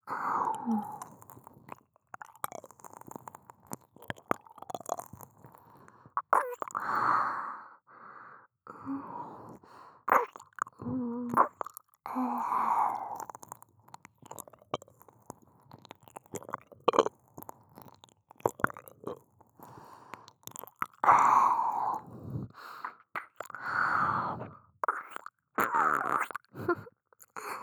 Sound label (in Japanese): 右耳舐め, ASMR; アドリブ集